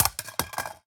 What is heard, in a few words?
phys mag drop ar poly concrete, magazine drops